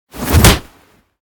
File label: dizzying punch, battle